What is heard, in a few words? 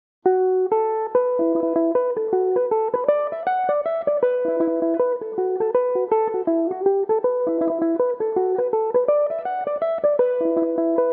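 E dorian, mandolin; The Green Fields Of Rossbeigh; Belmuse EM-190 electric mandolin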